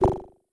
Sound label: lem weight; dos lem